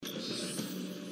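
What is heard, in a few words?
hand link notification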